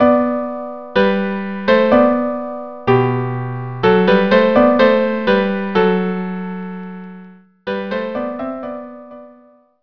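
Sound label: violin cello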